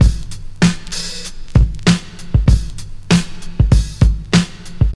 97 bpm drum groove c sharp key, kick tuned to the C# note